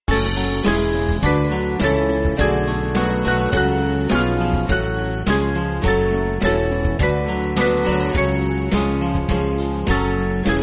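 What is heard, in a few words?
Band, Backing Files for Churches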